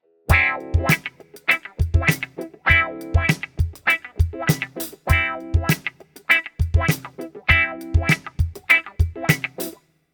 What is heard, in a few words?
wah, guitar